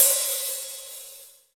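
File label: HH, hi-hat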